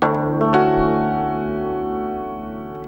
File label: ZIMB ARPEGGI; Best Service; World Colours